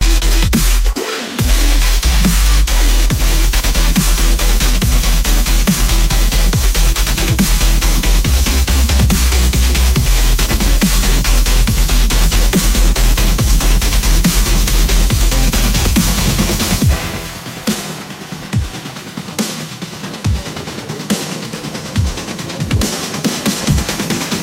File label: electric heartbeat